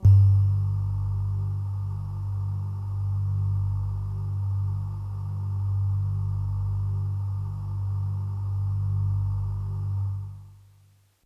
Bell Air Kawaii G-1

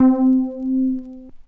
Sub37 Sine Chorus Vinyl Synths C3; vinyl keys

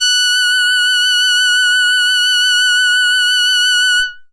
Box Cello DX100 Fs6

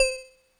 Drops C4

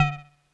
Drops Kawaii D2; keys

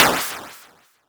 Noise

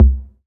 TOM

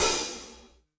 OH Acoustic Dark Stereo

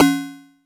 Sine Perc

